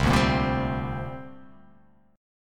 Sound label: C 7th Suspended 2nd